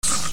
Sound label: OFFICE